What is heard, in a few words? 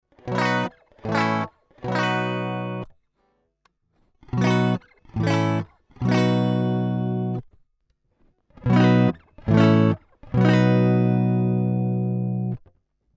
API 512c DI Jazzmaster 10 30 o'clock Gain